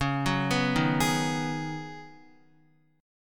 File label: Db 7th Suspended 2nd Suspended 4th, Guitar, Db7sus2sus4 chord; JGuitar